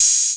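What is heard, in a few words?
open hat, 808; musicradar-synth-samples; Classic drum machines; 808HATS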